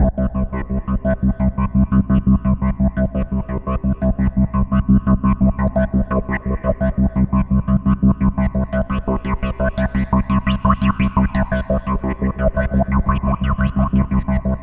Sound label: C1, CLUB ORGAN; Roland JV 2080